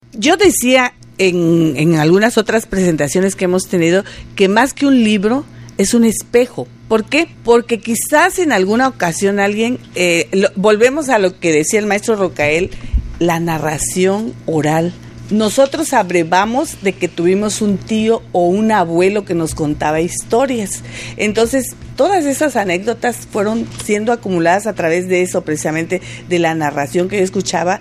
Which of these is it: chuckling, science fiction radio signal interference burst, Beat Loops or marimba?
marimba